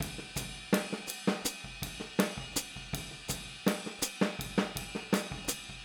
Boogaloo Chorus Hi-Hat, 82 BPM

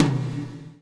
GATE TOM, Drums Acoustic; USB Soundscan vol.10; AKAI; 90s sample CD; GATEKIT 2

